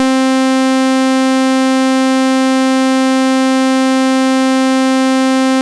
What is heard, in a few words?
Moog Saw C4; Moog Voyager; Moog Voyager Sounds; VES1 Saw